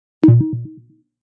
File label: Bleep; Beeps